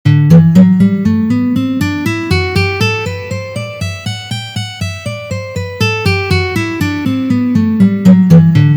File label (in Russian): Gamma Sol mazhor Tri noty pozitsiya